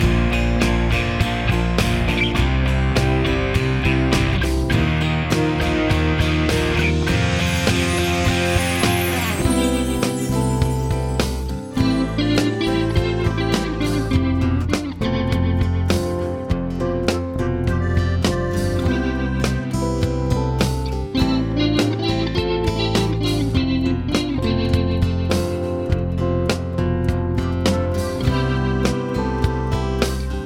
folkslinger library hard to soft

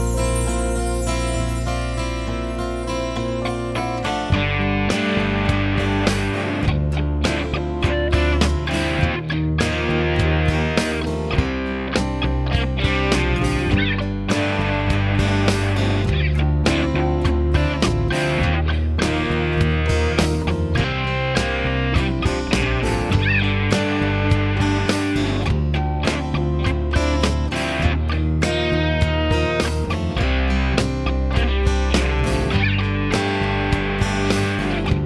folkslinger library

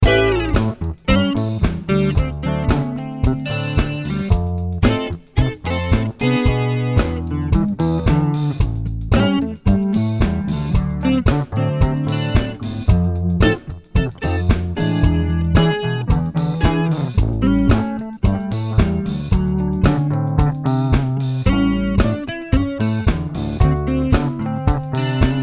groovy swing

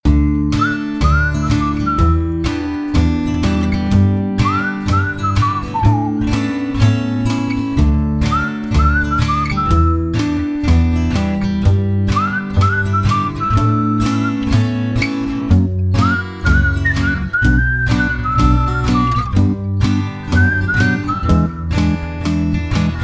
Dumdum Whistle

